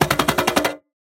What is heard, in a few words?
train starting; soundscrate